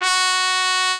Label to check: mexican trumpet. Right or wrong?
Right